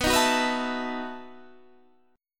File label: E Major 7th Suspended 2nd Suspended 4th